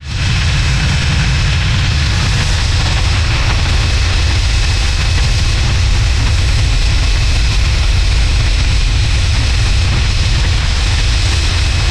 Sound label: passenger plane in flight